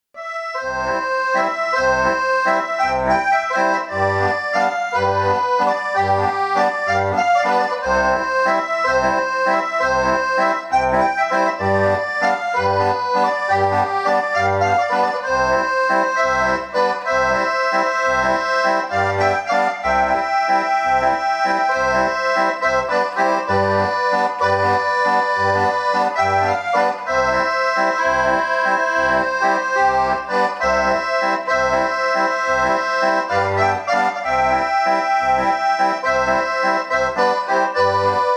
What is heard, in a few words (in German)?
Kuckuckswalzer auf Roland in CF; Internationale